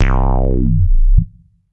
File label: Squelch, Synths BASS